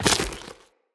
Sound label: Run Goo, Footsteps; Tenno